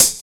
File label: subtle high hat sound g sharp key